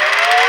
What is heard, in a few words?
minigun spinup; Modern Warfare